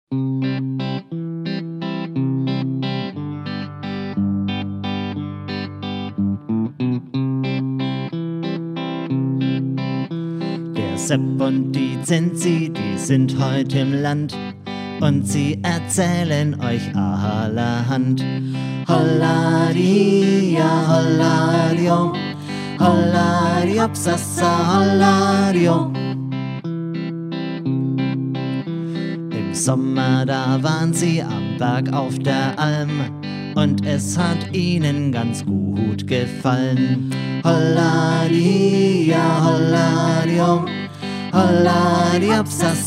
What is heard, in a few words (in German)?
Oktoberfest Schnaderhupferl; mimicus